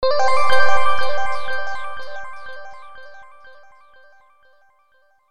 ALERT

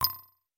chirp

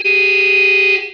vehicle

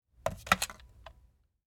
Phone Pick Up

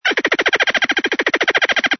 ANIMAL CALL

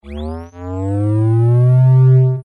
recharge shield; xbox 360; halo

